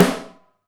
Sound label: Snare, Alesis DM5; Drums